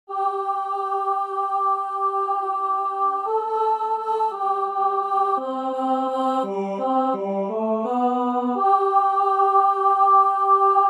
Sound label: Chorus